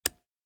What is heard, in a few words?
flashlight on